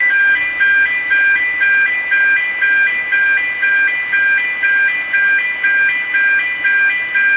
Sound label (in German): warnton drehleiter rueckwaertsfahrt